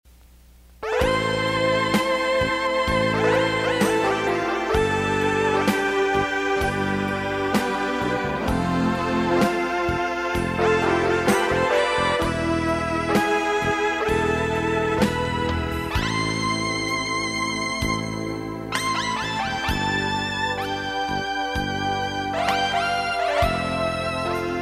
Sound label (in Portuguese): Instrumental